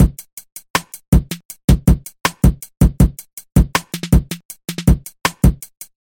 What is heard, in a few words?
repeated drum beat music call tone